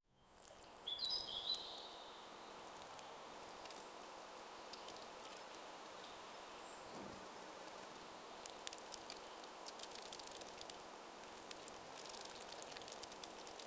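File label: gentle spring garden ambience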